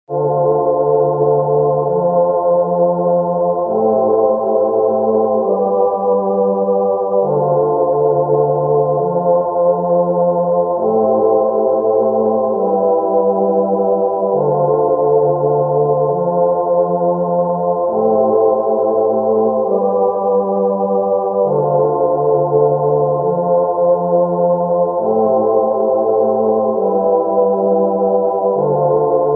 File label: Dark Voc Pad